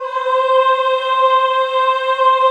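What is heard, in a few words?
Choir C4, Ehh; Eeh + Ehh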